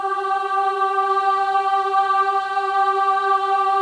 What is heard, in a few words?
WOMEN AHS G4; Spectrasonics; Symphony of Voices